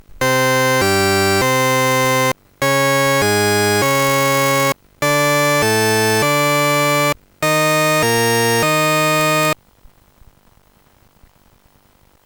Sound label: tune; Sonix Music Driver